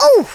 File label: oof; Blues Man